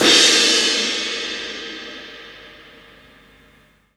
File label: CYMBAL, CRASH; Sonic Foundry (Sony Creative Software); Loops & Samples Drum Tools; Laid Back